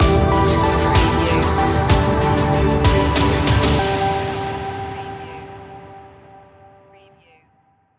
dancing wind stinger

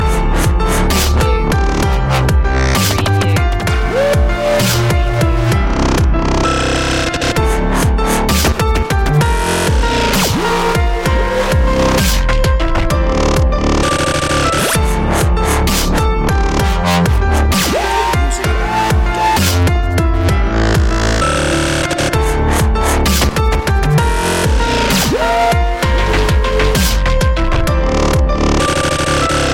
acid rain loop

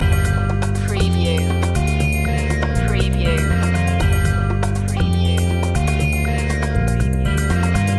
ethno breaks loop

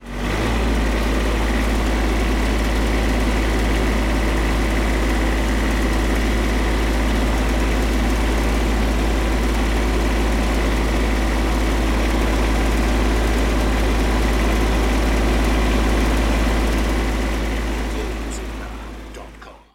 Tractor Deisel Driving, On Board Perspective

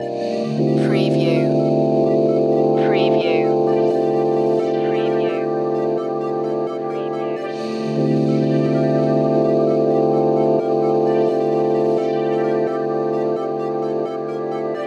unsavoury location loop

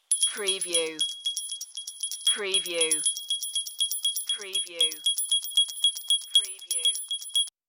Jingle Bells

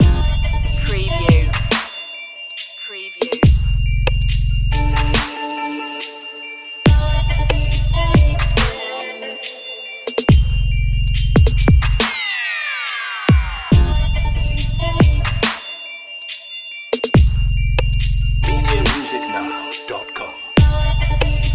your own trap loop

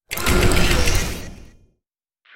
sci-fi display switch

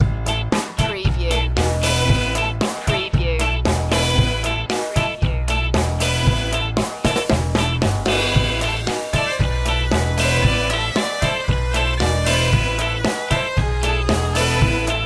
ska

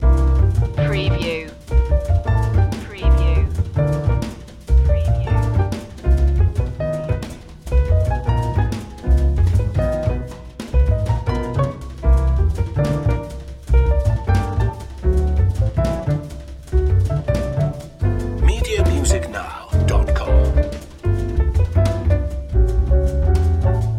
jazz up loop

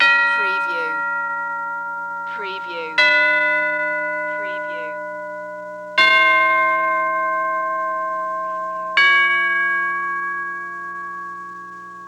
Tubular Bell Ascending

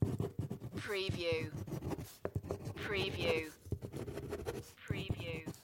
writing ballpoint pen

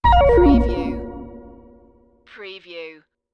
Scifi Audio Logo Ident, Short, Descending, Droopy

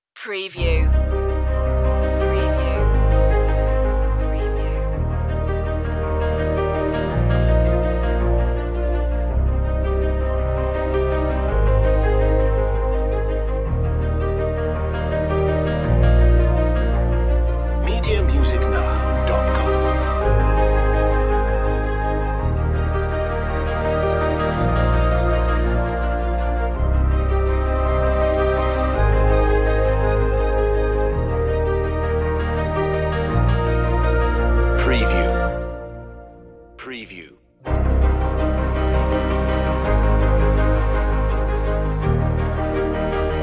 no perc

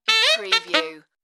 Crazy Sax Riff

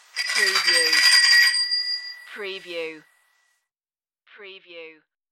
Bell Bicycle Antique

